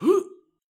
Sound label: Voc Male Jump